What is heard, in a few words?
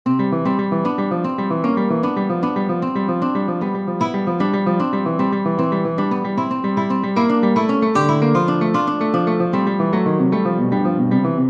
for classical guitar; burgma ller johann friedrich franz; confidence